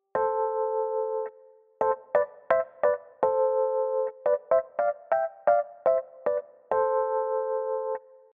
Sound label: 3rd Intervals